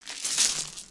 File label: Lego Droppings; foley